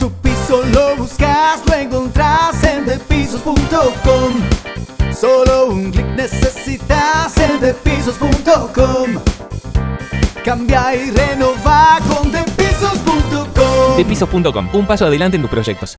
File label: Jingle